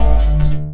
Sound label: 808 Bass, Fx; Sonic Foundry (Sony Creative Software); Esoterik Beatz